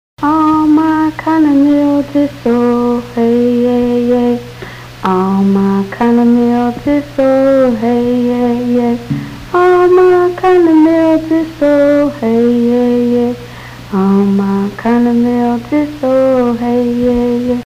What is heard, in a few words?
Kitten Lullaby, children songs, Cheyenne language